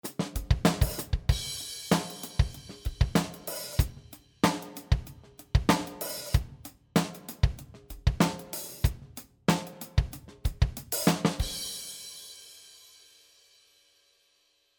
One Kit Swing Jazz; fxpansion; BFD3

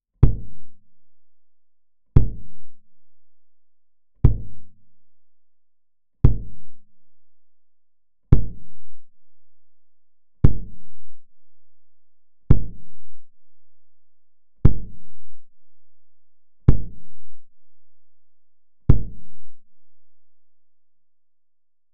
Kick Close 15dB Pad

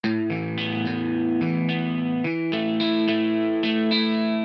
Arpeggio

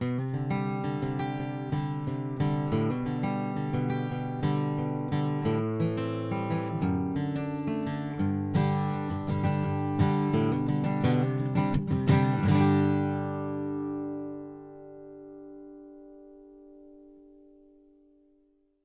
Acoustic Guitar; earthworks QTC50

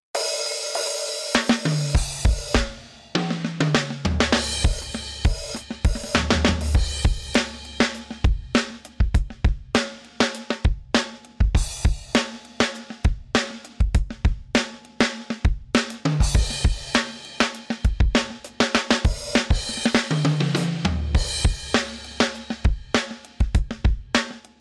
EDM 100bpm

